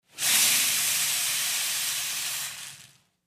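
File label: Detergent Powder Pour, kitchens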